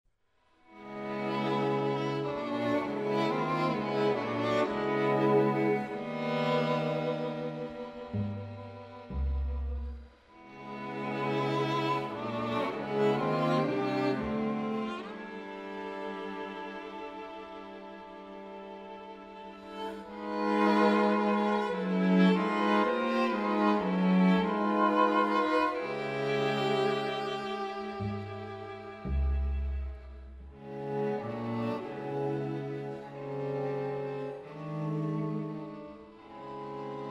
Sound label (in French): Quatuor a cordes; Choveaux